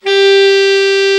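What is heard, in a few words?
alto, sax; Best Service Gigapack 1; BR SAX 1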